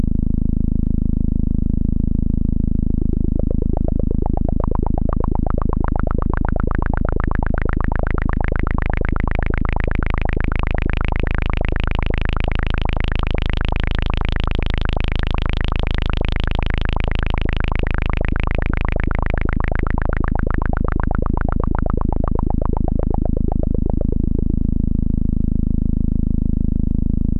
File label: bubbles; NOISE TOASTER